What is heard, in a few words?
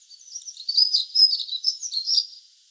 Birds Various, Birds; outdoor